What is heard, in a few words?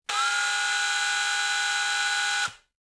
Drill